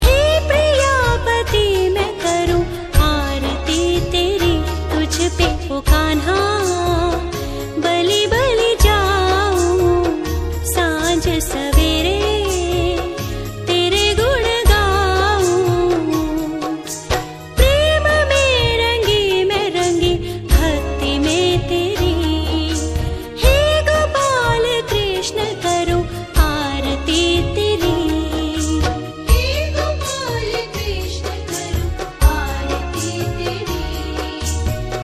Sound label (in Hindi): Aarti